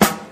clear snare g sharp key

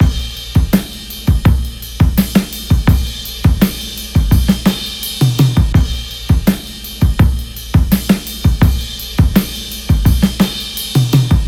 84 bpm drum loop sample b key

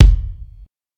long kick drum one shot d sharp key

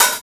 studio hi hat f sharp key; hat samples

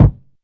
dope kick g sharp key; kick drum samples; one shots

